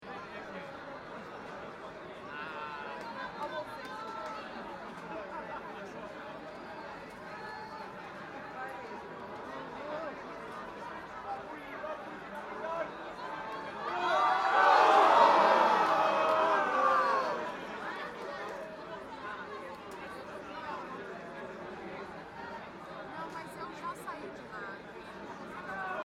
England London Football Crowd Reactions Small Crowd Background Atmosphere Cheer At End; Crowd Sound Effects